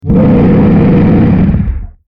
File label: Monster Growl; horror; horror sfx vol 1